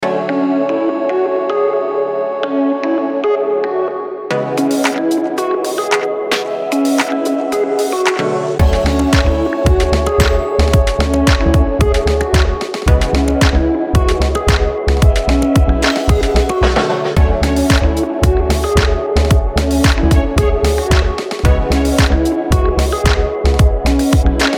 chill house

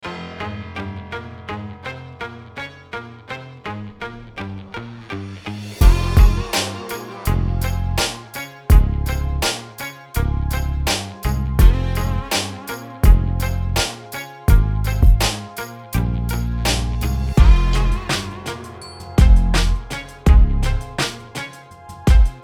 hip hop rock

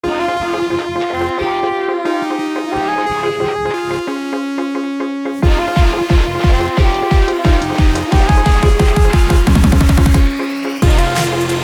drumstep

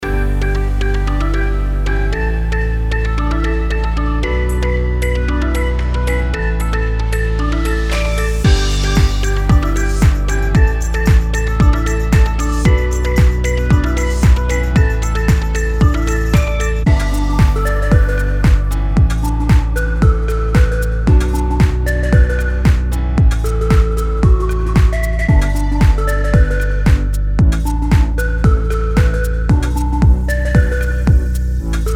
Pop EDM

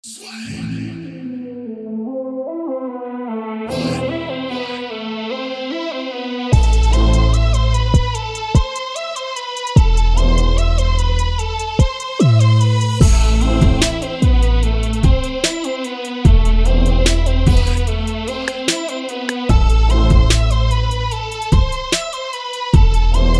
dirty south hip hop

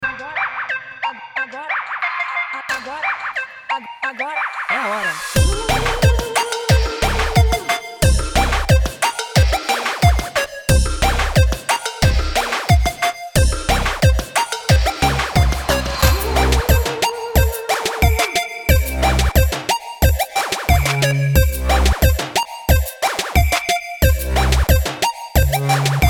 zouk bass

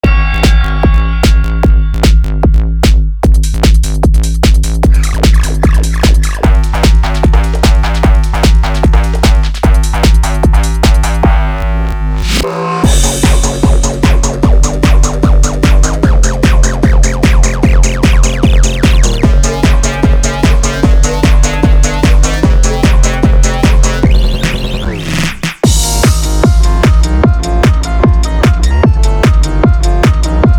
Crystal Distortion

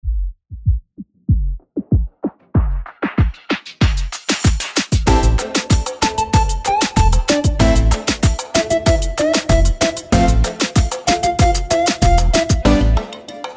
reggaeton; Reggaeton Radio

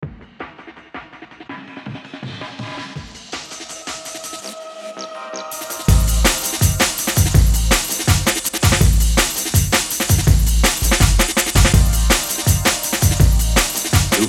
dnb breakbeat

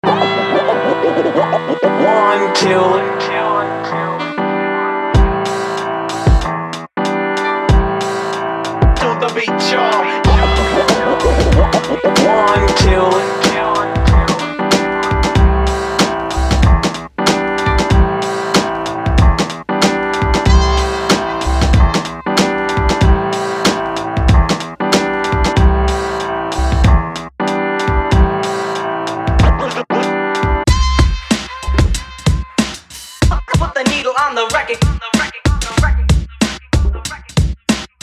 nyc soulful beats

